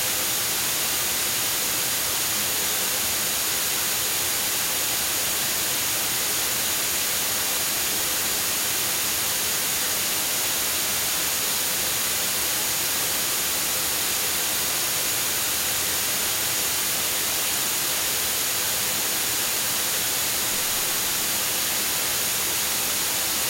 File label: White Noise UMIK2 Incidence 0 deg